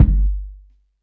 fat steel kick drum sound g key, kick drum one shot tuned to the G note; kick drum samples